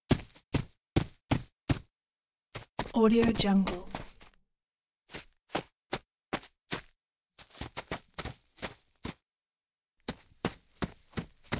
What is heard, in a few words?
footsteps dirt